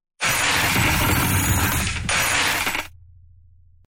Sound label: spill over treasure